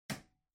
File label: Light Switch Rocker, Far Dry Hard